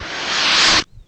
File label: HELMET SOUND, Science Fiction; Mower Man